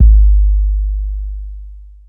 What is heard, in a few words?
Kick B Hit; 102 Ebmin; Hip Hop Exotica; Apple Loops; Big Fish Audio